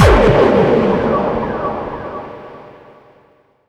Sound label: Hits & Explode